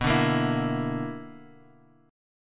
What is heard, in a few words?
B Minor Major 7th Double Flat 5th B, Guitar, Open G